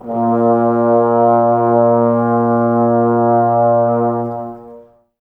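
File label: Brass trombones A#; Miroslav Vitous - Woodwind Brass Ensemble; Tbs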